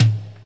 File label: house Tom; House Kit; STYLE KITS (Hip Hop, Etc); Choosy House Kit